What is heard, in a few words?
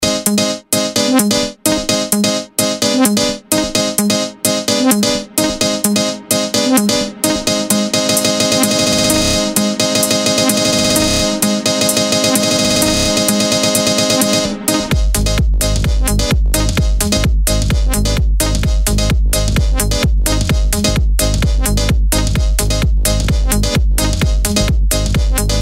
Crazy Piano